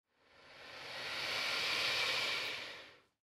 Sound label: Alligator Hiss, Alligator Hiss Fast; Animal; Alligator Sound Effects